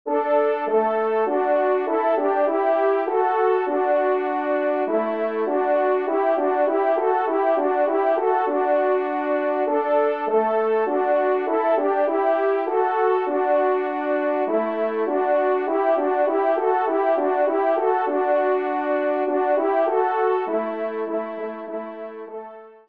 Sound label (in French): Cotillon Do Maj, trompe; 2e Cotillon; Corrette